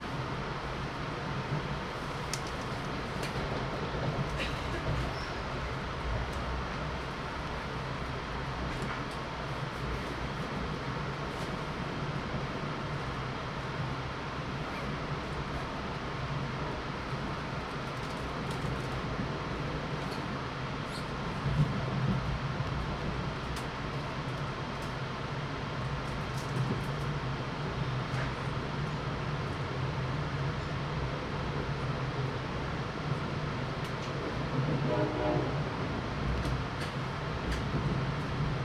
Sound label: INT Passenger Train Ride Metro North Empty, Vehicles; AMBISONICS